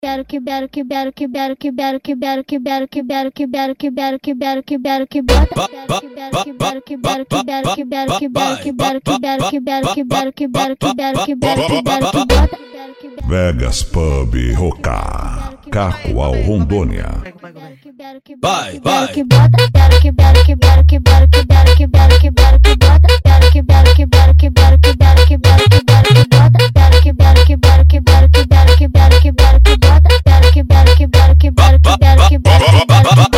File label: funk mega bass